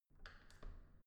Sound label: entrance door handle down soft